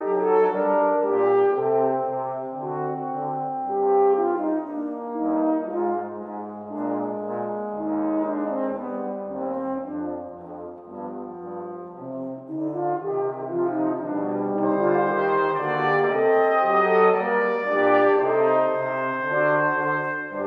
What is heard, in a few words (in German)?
Fuge g-Moll vierstimmig